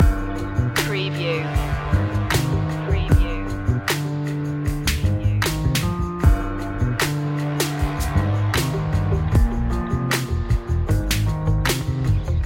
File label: gloomy hip hop loop